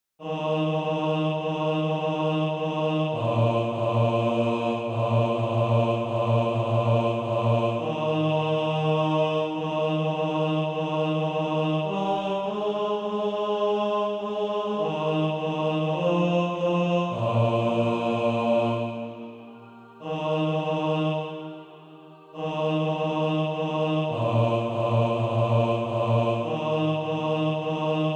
bas; A Home in My Heart for Jesus

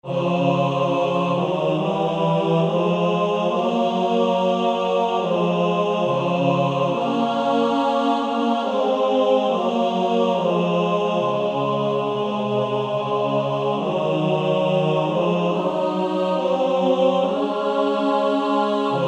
Male Choir TB; Lead Me Gently Home Father

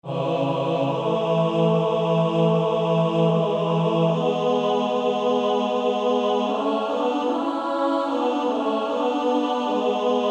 Male Choir TB

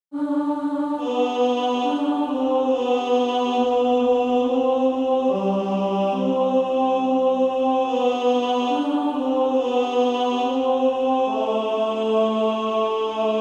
Female Choir; Give me Joy in my heart

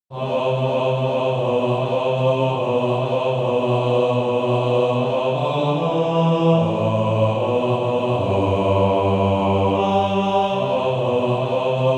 Male Choir B2; Infant Holy

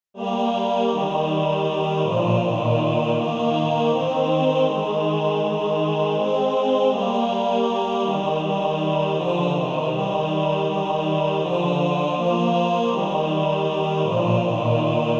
Male Choir; He Leadeth Me

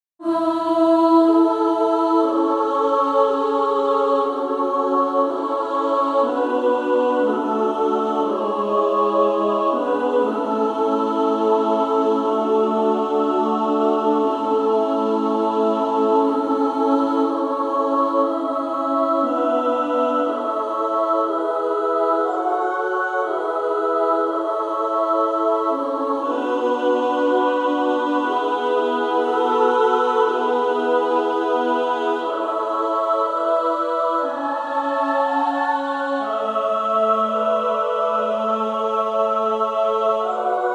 Female Choir SSAA

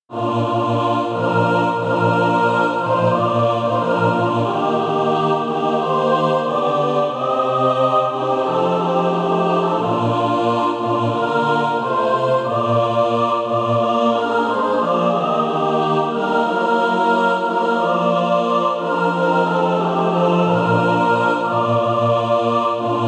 mixed; SATB

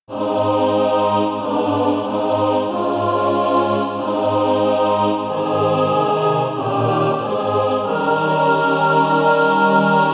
mixed; SATB

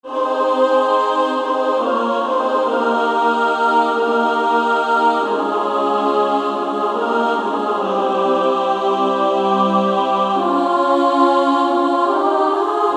Female Choir; SSAA

